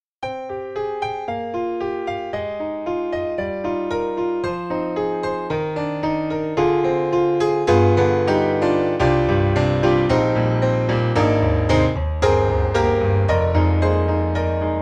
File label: Mini Grand Hard Piano; AIR Music Technology